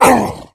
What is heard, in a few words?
become enraged, infected